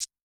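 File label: Maracas, Perc; Yamaha DTXpress-II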